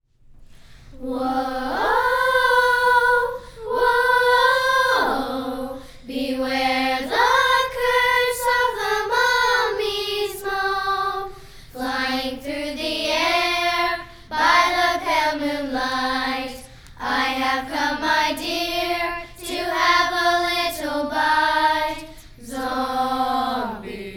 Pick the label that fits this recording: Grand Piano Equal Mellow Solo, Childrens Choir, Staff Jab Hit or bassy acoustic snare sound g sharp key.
Childrens Choir